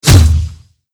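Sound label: guns gun grenade; Shattered Horizon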